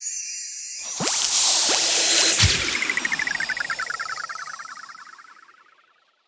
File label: Unlock Skin; Subway Surfers City; Android, iOS